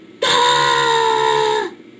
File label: ahhhh; Brickshelf; Avatar